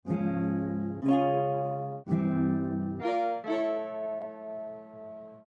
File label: correct C; SnootGame